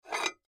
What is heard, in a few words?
Plates Stack, Plates Stack Remove Single Dish; Dishware; Household